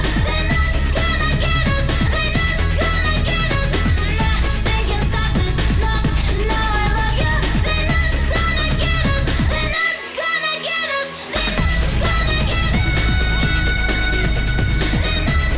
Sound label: Loop; TwoFastTuFurious